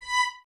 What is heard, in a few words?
Violins Detache B 5; Miroslav Vitous; 11 Violins